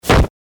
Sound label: body Slam; Pinata; Lucha Rumble